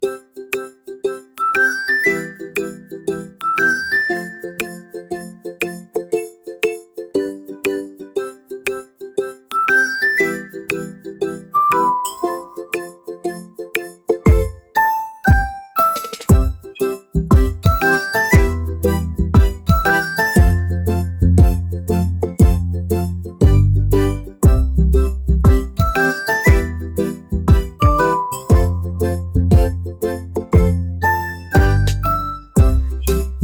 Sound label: dopamine ukulele